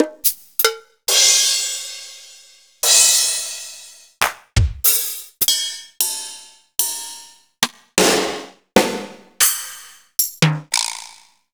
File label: Korg ProWave